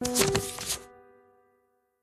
wood tile flip

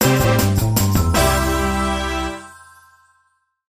gameshow reveal

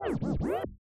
wood game menu

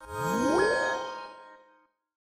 reveal

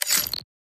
space game menu

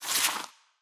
winter tile pickup

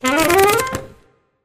tile appear; newsroom

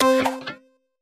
game show tile flip

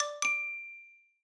block wheel resume

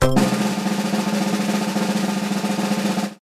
gameshow quiz choose answer

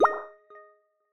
conveyor item centred

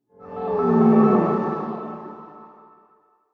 space whale

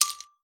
block tile drop

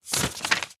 tile flip

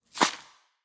winter tile drop

